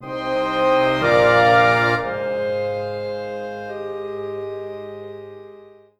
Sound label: Transition; Z9 Mid-level Achievement.